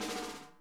SNARE, Interactive Drum Kits

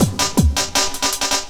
LOOP, 160 BPM, Drum & Bass

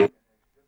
Tom Lo Speaker, Stylophone Beatbox

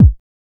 Kick, Trance

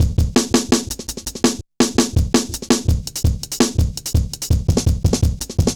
Jazz Shuffle, Drum Loops; Jungle; 166.5; Zero-G Jungle Warfare 1, 2 & 3; 90 s Sample CDs

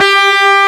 G3, GUITAR; AKAI S-Series CD-ROM Sound Library VOL-8

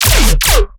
bass hit chonk lazer E; Virtual Riot

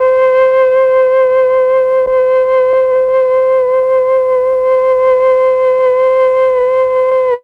flute; ILIO; Propeller Island Legendary M400

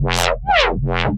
Reese Bass F; Antidote Advent

